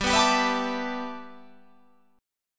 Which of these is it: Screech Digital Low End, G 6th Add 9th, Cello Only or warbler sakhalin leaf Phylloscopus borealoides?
G 6th Add 9th